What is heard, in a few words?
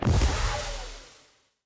toxifin land death; minecraft